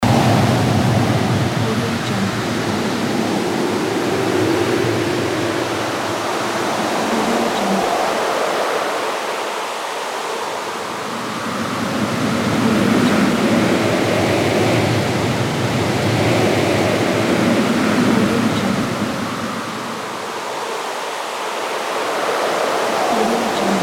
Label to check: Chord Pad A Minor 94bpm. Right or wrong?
Wrong